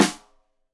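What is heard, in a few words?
Snare Stereo, Drums; Deeply Sampled Drums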